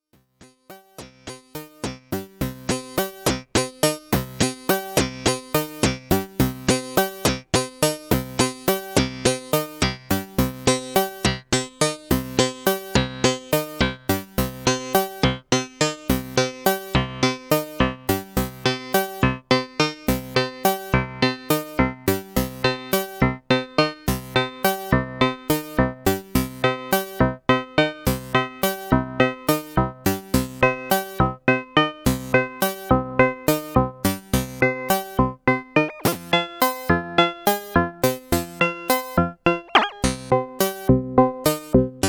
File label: Single Track DSX Filter CV; OBSX